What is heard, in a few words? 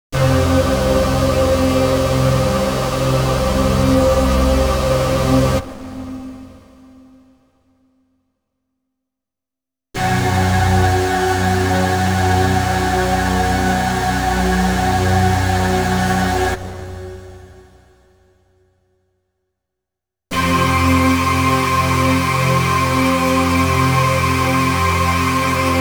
dance & techno, lead; Inspiration Zone